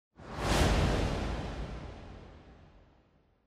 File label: Camera Motion Reverb; シーン・カメラモーション